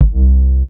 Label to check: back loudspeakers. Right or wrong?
Wrong